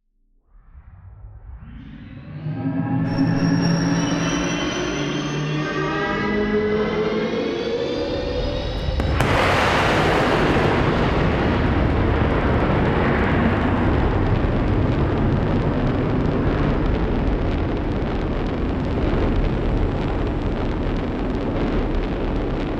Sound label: Spaceship Launch Shut Off